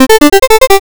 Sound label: pico talk